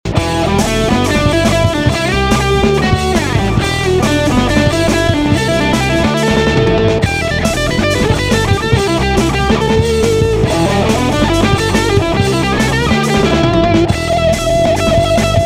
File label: gibson epiphone